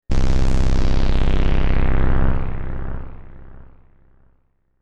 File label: Big Drone